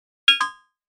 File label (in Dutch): Afkeuring; Audiodesign